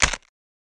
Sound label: Cabbage Snap Quick; gore stabs & eat